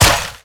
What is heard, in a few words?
Step Default, Infested; Zealoid Prelate